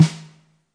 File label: real, drum kit; REAL LIVE KITS; A Real Freakin Drum Kit